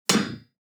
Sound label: RAMP IN; SIMUZENSOUND